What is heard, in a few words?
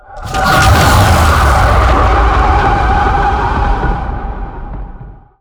Tau Sentient Vessel Scream; Warframe